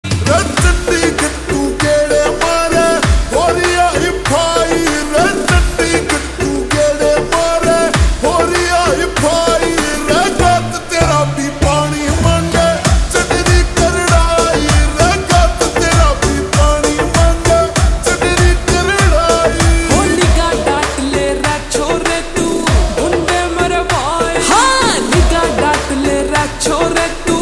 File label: Slow Reverb Version Ringtone